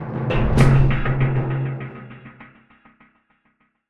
Stinger

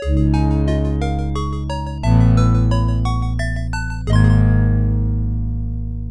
Jingle [Revival Jingle]; Tamashii no Fukkatsu; Shining Force Genesis

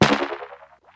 charge up fire

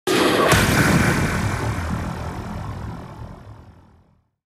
death

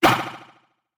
whipper pain

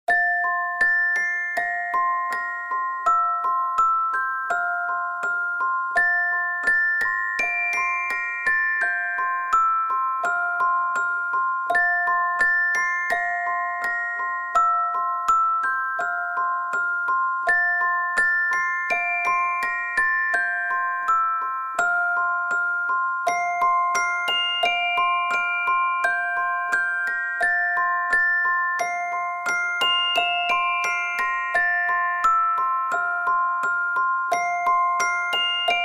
Lullabies; The Sims 4; Storybook

